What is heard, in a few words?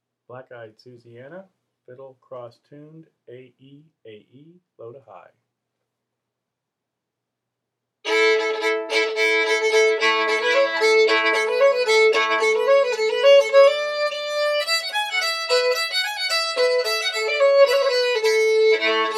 fiddle; festival tunes